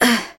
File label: Dizzy; Sheik dizzy